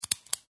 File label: click